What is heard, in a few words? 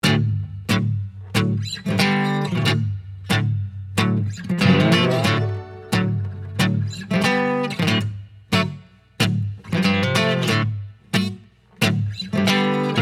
Blues